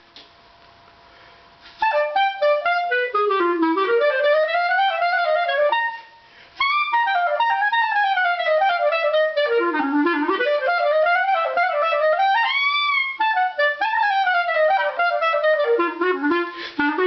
Clarinet

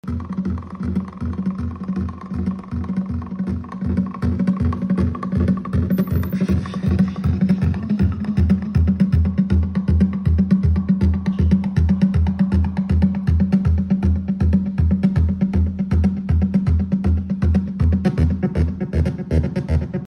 modular

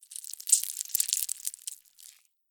animals leach or worm slimy movement squelch; zapsplat

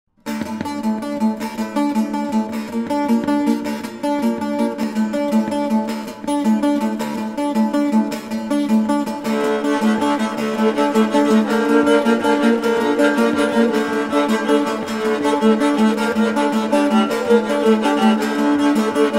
rebec

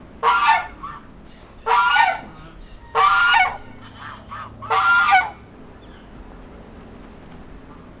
Goose Sound

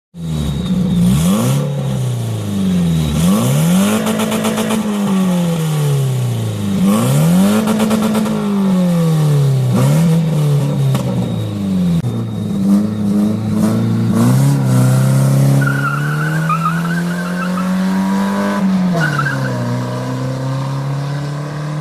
xantia

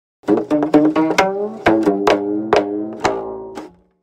Geomungo, ساز سنتی کره‌ای

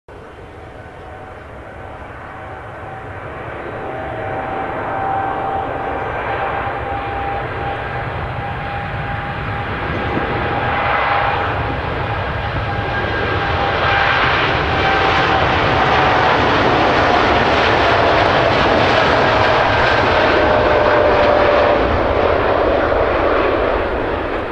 Boeing-787; بوئینگ ۷۸۷

